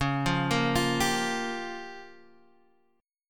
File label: G Minor 7th Flat 5th C sharp